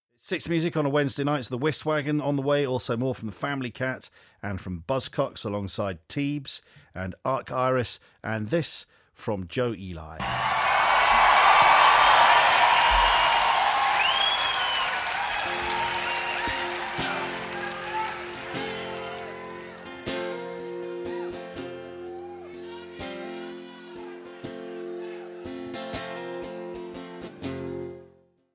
applause applause

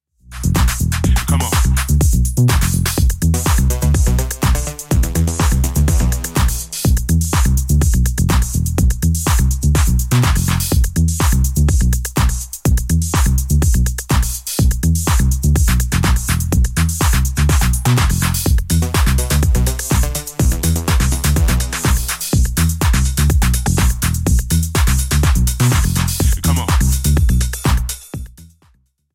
drums

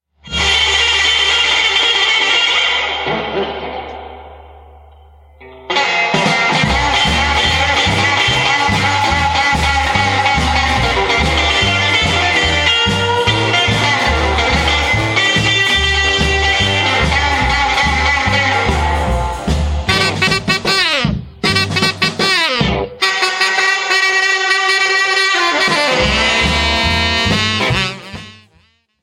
space guitar

